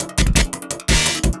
LOOP, Drum & Bass, 170 BPM

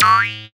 Boing, cartoon; Classic SFX; musicradar; cartoon caper

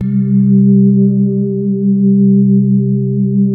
METAL VOICE; Wizoo - Powered Wave

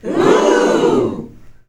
OOOOHH; ClapsOohsAahs; SFX

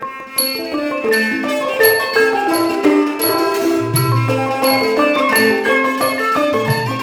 86 THAI, THAI ORCH PH; Spectrasonics - Heart of Asia CD2

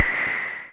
noise fx; Masterbits; Switch FX

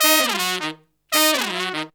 160 bpm, horns

